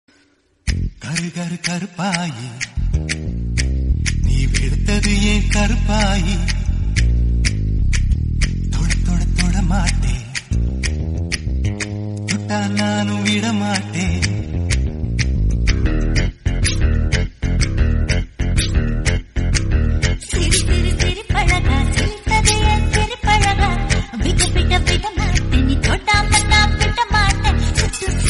tamil

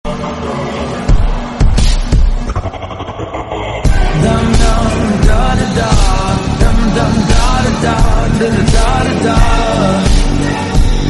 slowed reverb

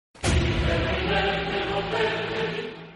epic; step brothers

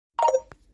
samsung message notification

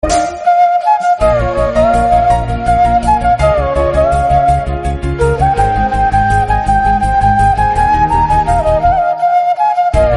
intro bgm; ala vaikunthapurramuloo

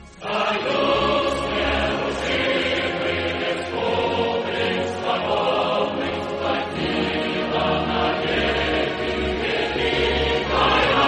ussr anthem

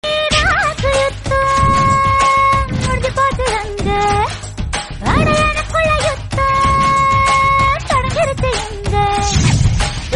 romantic bgm tamil

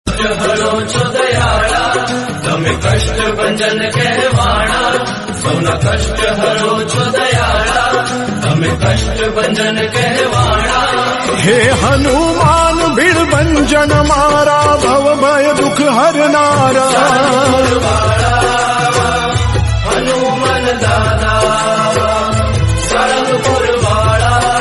bhakti; kastbhanjan; hanuman

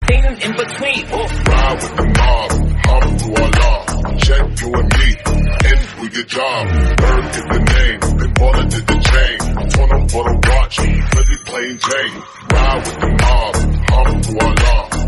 english, bgm

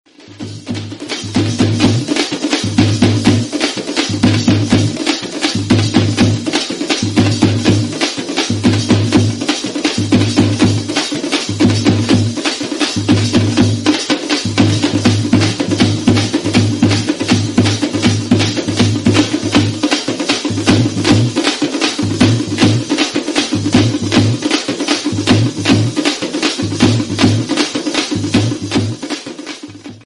bgm, instrumental

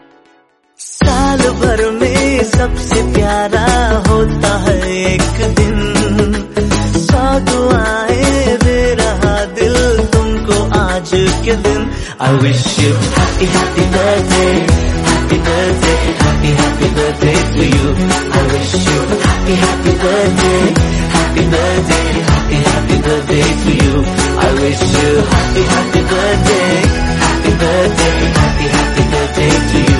birthday song

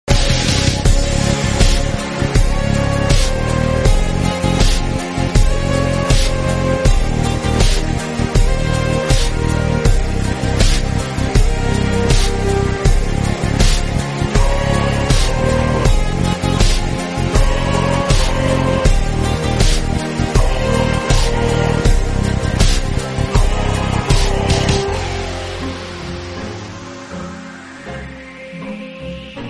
instrumental slowed music cover

